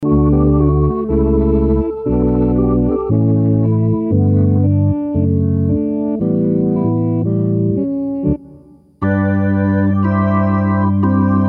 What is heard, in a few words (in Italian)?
organo; IK Multimedia; iRig; SampleTank iOS